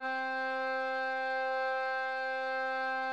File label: instrument note